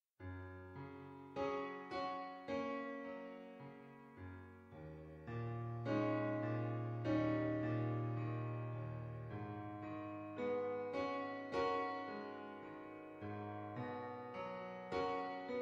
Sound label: Piano Cover; Fez